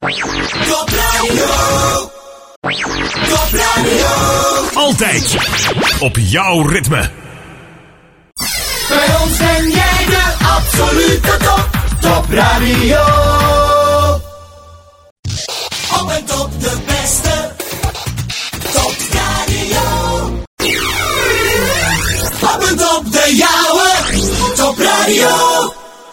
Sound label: jingles; Topradio